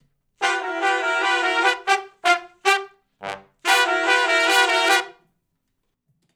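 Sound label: R&B Riff (G) harmony to unison; Sections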